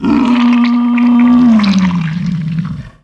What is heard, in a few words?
anim die; DAoC